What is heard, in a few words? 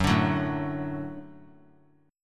Gb Minor Sharp 5th Gb; strummed; JGuitar